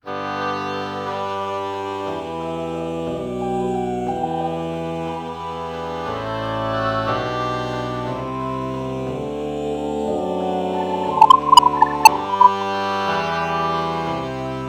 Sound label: oh oboe morph